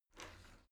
shower slide door slide short open

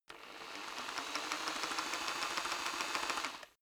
rock drill hammer speed up short soft; maschinen; bohrer; soundpool

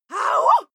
female shout take swing; schreie; menschen

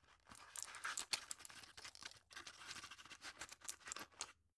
drug put in shaky; berufe; arzt

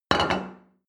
plate place table hard

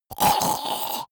monster choking zombie short; koerpergeraeusche; comedy-cartoon; soundpool

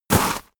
step walk gravel

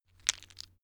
stone gravel clatter short; natur-tiere; steine

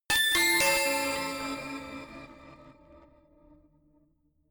notification glockenspiel crazy triad up minor; instrumente

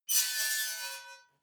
metal squeal bright high; metall

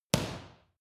boxing paw hit hard

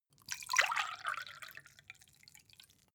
glass pour soft; soundpool

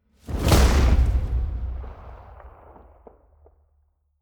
soft gunshot whoosh; schusswaffen

